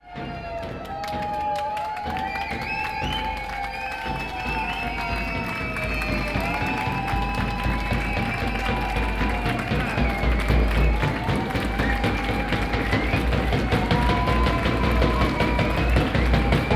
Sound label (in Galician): Entrada Obradoiro